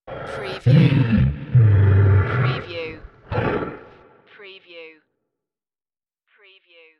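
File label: Beast Growl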